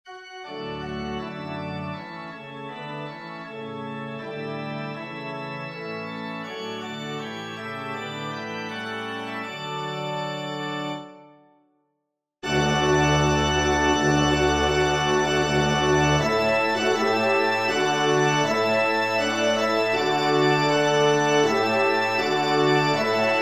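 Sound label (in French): Trompe Orgue; Offertoire; messe cantin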